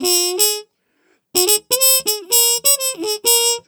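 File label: 130 Nu Jazz Trumpet, Ab major; Brass; Pro Tools Essential Loops; Digidesign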